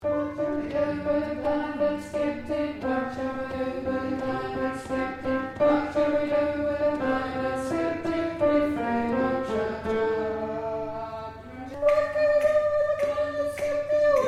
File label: Tenor